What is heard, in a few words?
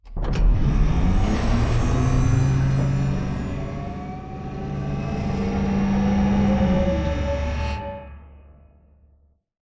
Giant Floor Panel Arrive Servo; Boomtown; Sideways Town; Titanfall 2